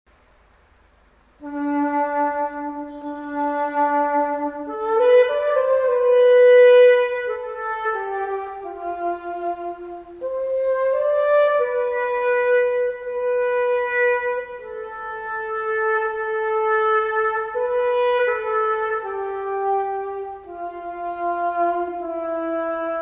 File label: cornet, instruments medievaux